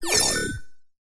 science fiction computer console gui robot complex beep